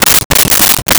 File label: Write on Paper with Magic Marker; Office Supplies